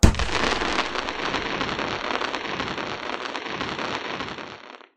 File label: boom, firework